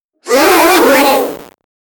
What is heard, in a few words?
kids epic sound